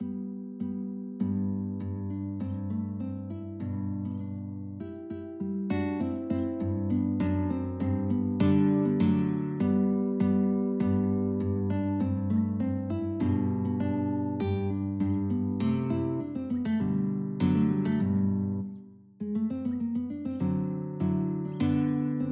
Romanza, guitar

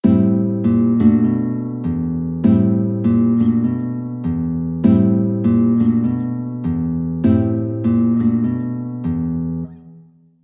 guitar

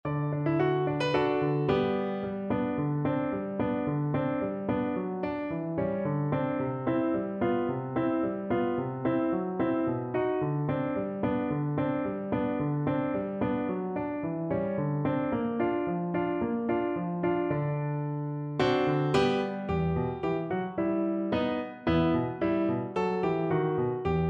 soldiers chorus, cello

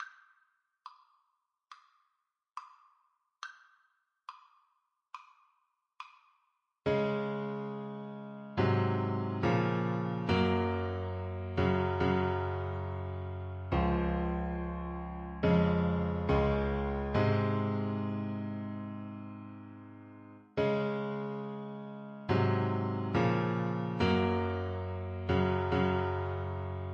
nearer my god, Saxophone

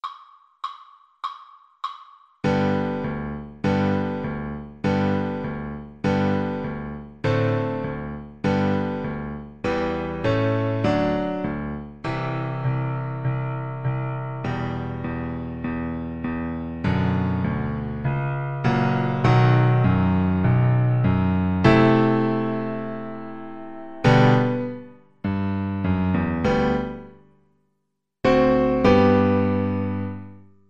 bizet carmen toreador, oboe

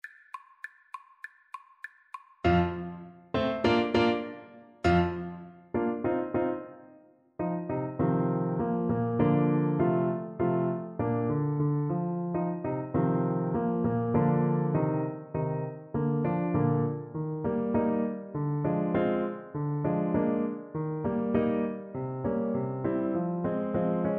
pizzicato polka, Saxophone

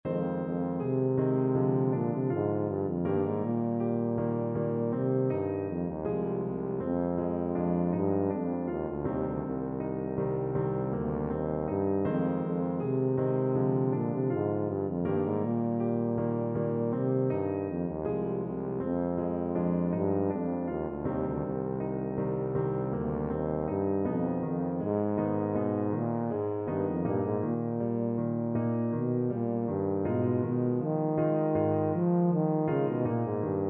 borodin polovtsian, tuba

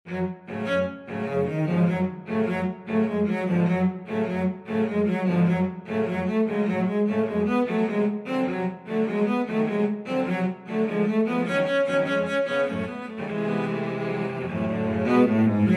cello quartet

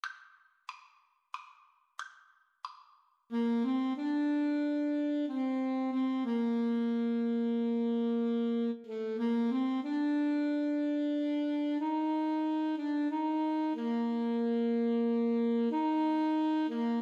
shenandoah 2 A SAX

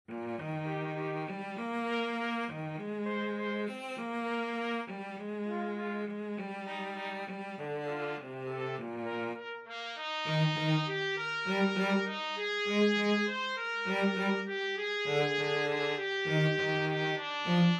trumpet cello; schumann op69 10

